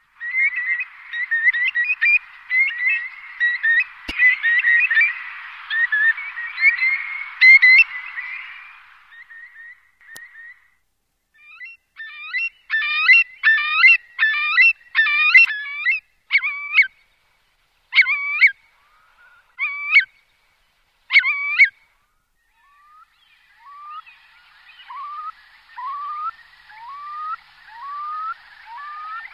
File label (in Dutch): Wulp; Cursus Vogelzang